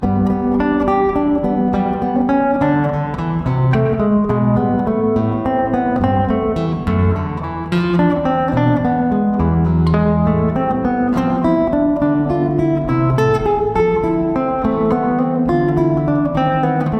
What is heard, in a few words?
Classical, classical guitar; Jesu Joy of Mans Desire